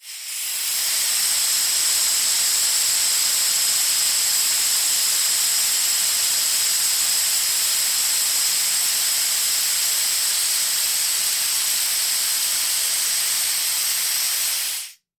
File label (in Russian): potok vozduha iz otverstiia